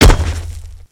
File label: WPN Impact; creatures organic